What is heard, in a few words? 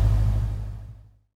stop, vehicles; kuruma